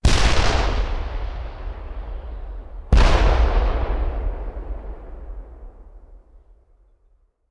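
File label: Artillery Rocket Launcher; Sound Ideas; Guns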